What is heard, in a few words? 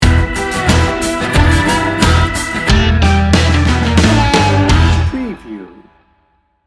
macho blues rock sting bumper